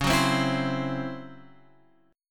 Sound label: Db Minor Major 7th Double Flat 5th Db down Guitar Open F; strummed; DbmM7bb5; JGuitar